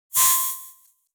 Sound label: game sfx a fast clean; sound effect